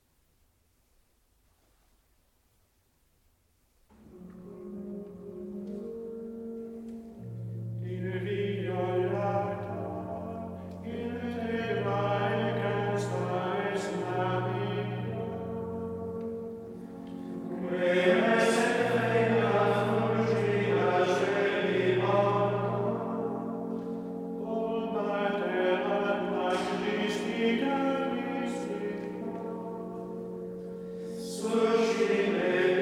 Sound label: gregorian chant inviolata sequentia in honorem beatae mariae virginis; Marian hymns